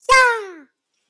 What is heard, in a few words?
lol button